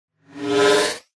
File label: Spaceship Short Takeoff